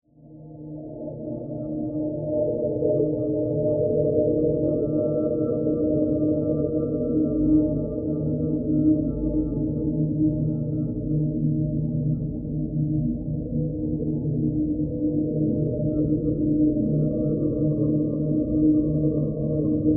Drone Alien Whale, Song, Ethereal, Scifi; Science, Fiction; BlastWave

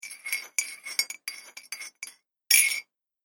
Stirring Spoon In Ceramic Mug; Foley Sound Effect; Clink Sound Effects

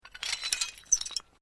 Glass move quiet; Material components for constructing more complex sounds; Materials

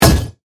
Hit Shatter Thud; Sound Design; BlastWave

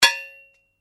Blade Heavy Impact, Sword; Foley sounds for melee weapons; Combat

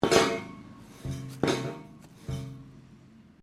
METAL POLES CRASH; Metal (Sound Effects:Materials)

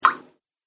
Water Button, button sound effects; multimedia

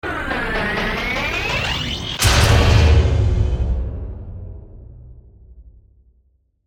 Transforming Robot Charge Up Hit Sci-Fi; Blastwave

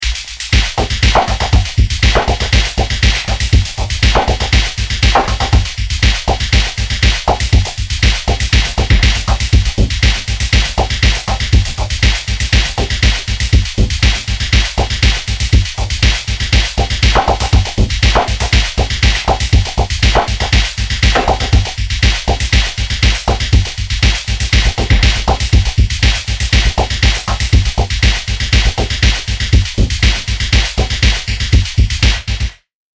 Future War Drums Loop; Sci Fi Music

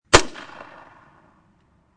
M203 Barrel, Grenade; Grenades; Explosions; VRSonic

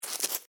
Insect Dig Sand, Bug, Movement; Animal; Blast Wave

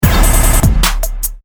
Turntable Scratching Beat Grid; DJ; Sound Effects:Musical

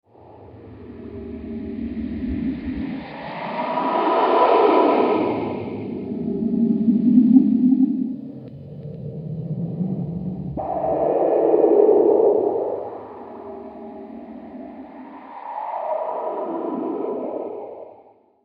Cosmic Encounter, science fiction sound effect; Andrew Fleming

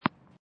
Tennis Ball Bouncing on Court Medium

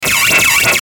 Laser Fast Warble Hiss Static; Production Element Imaging Element Accent Transition; Laser Sound

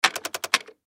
Button Switch; Multimedia Web Interactive

